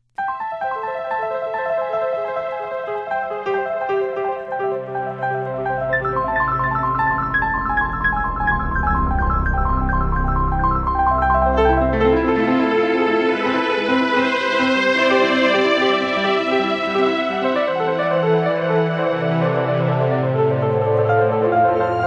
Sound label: Piano; bubbles rising and being sheared